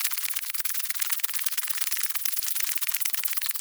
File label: Walking Loop; Mars Sortie